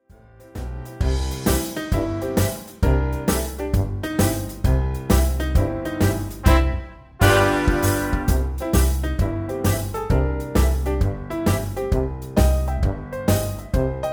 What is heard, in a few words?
Jazz Hands